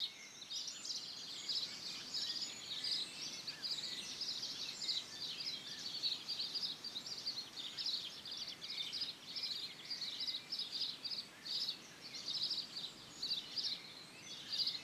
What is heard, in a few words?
village outside; CrossCode; evo village